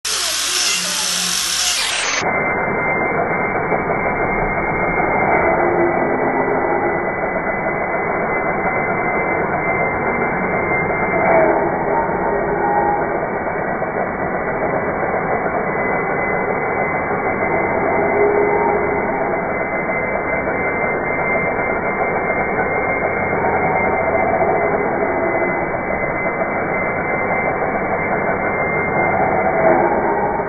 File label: power tool